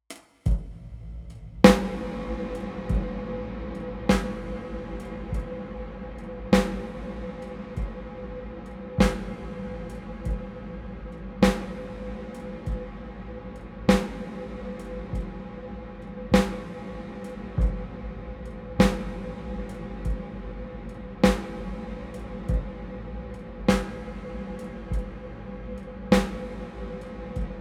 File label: Blackhole VST Drum